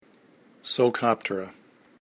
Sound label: Psocoptera